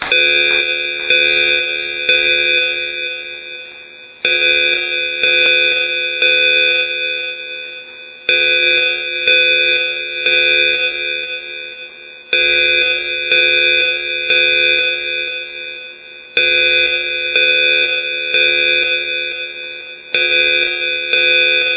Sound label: gentex cammander 3 code 3 horn; Brandon